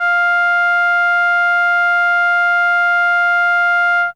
Horn F5, ARP Solina, Unmodulated; musicradar-synth-samples

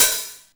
Cymbals Open HH; Vengeance Samples

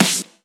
Snare; Vengeance Samples

